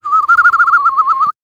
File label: Cartoon Weird Noise Short Bird Whistling